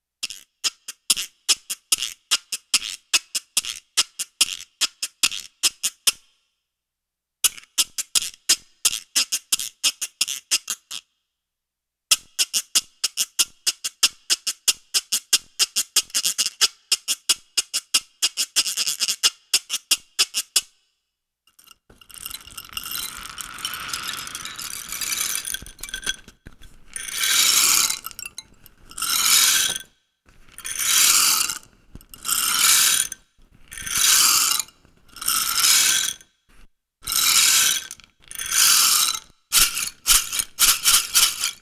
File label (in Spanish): guiro dos sonidos